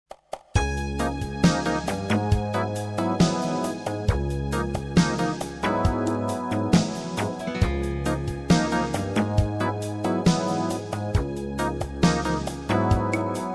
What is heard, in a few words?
sax